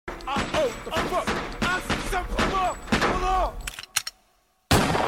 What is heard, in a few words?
Sms Shot